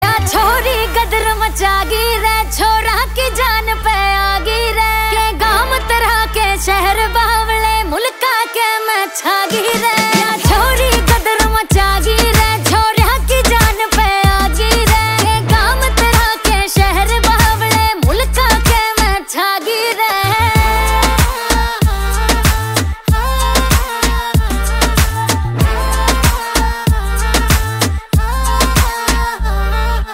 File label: Song Haryanvi Ringtone